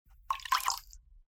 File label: Scoop water